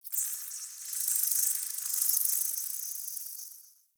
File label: Insect Day; Ambience; One Offs; Warframe; Eidolon Plains; Eidolon Landscape